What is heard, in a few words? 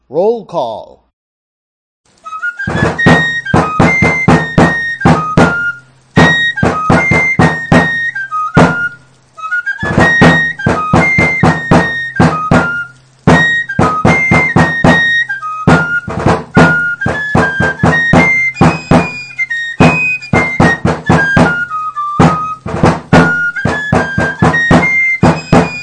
Roll call